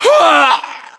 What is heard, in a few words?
death; ModernWarfare; localized english